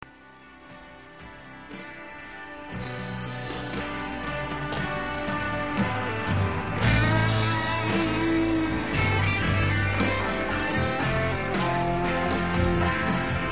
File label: solo - slow, guitar